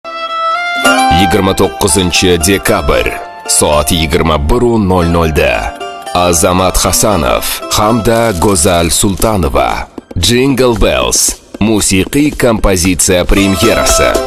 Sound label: jingle bells